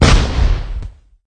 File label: Sfx Explode